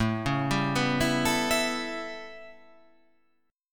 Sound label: A 9th, down